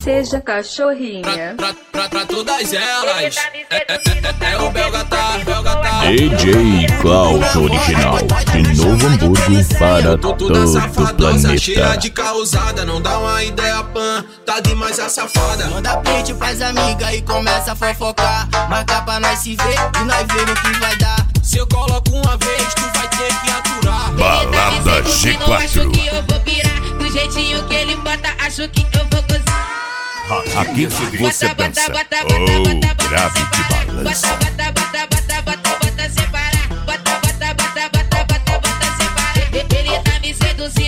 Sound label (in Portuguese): brega funk; putaria 2020